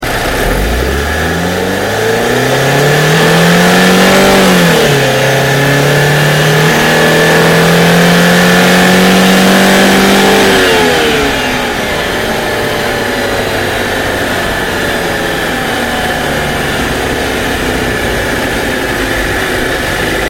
320i Acceleration Outside

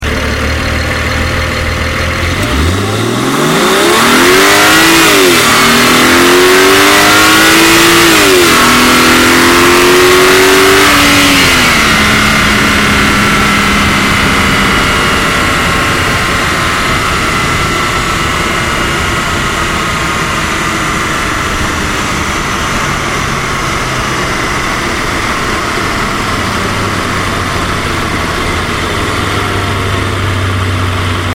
135i Coupe Acceleration Outside

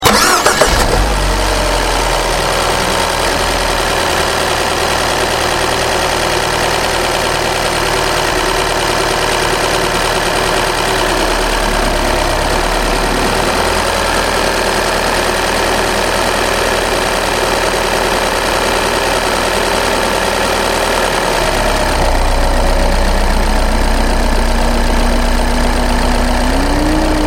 Mini Coupe ignition

